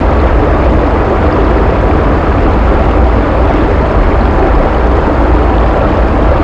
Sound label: pipeline big pipes loop